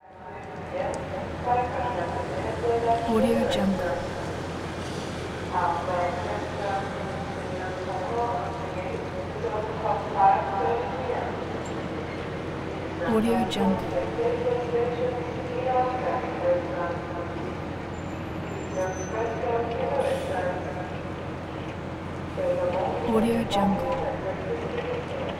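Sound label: ambience loading docks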